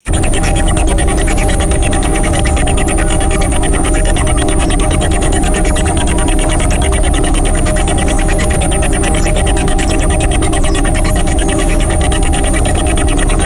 rapid pulsing synthetic energy motor; magic-scifi

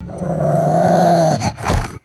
aggressive wolf growl and snarly attack; animals

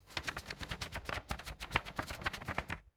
rapid flipping of softcover book pages

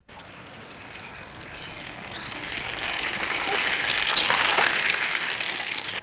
bicycle rolling through a wet puddle; vehicles

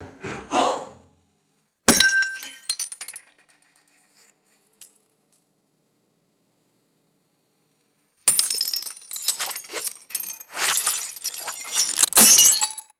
thin glass light bulb shattering and scraping

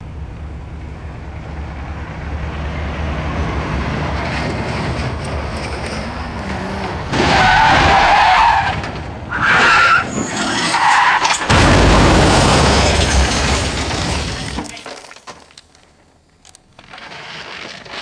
heavy truck crashing into car; metal

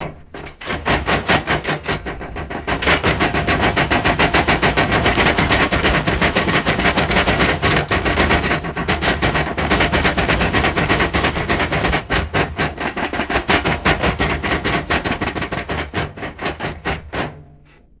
aggressive metal cabinet door rattling; drawers

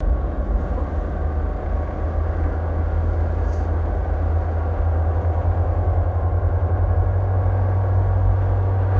futuristic electric car interior slow whine; vehicles

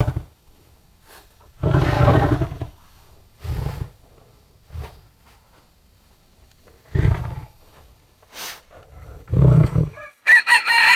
tiger sniffing with distant rooster crow; animals

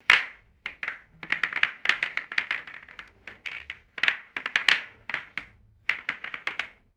billiard balls rattling and sliding in rack

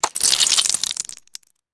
brittle icicle falling and shattering, ice

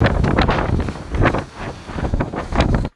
giant plastic sheet flapping in strong wind

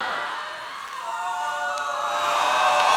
theater audience groaning and hissing

